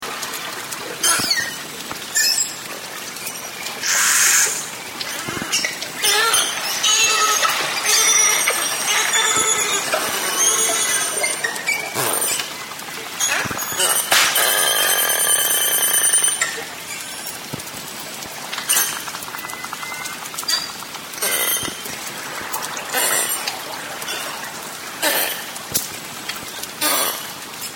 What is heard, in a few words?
beluga